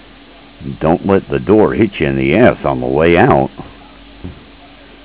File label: door